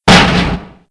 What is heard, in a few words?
Grill; Playable Tutorial Level